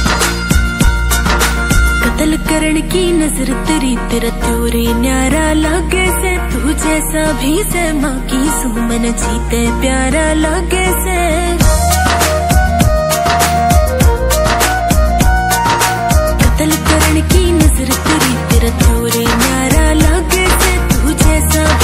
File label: HARYANVI RINGTONE